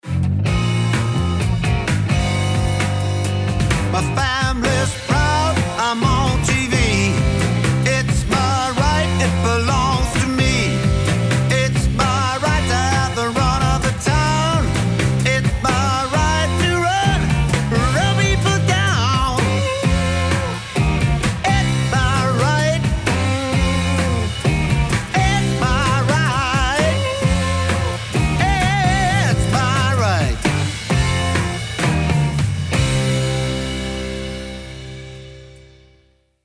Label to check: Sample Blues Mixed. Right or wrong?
Right